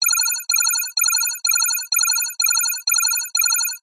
science fiction console alarm

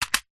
foley stones very small in tin set down ground; zapsplat

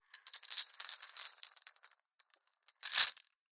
Chains pitched Chains Tighten Whip Swing; Just Chains; soundbits

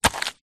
footstep single walking wet forest floor; foley; zapsplat

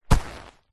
leather handbag or pouch grab

